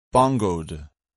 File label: bongoed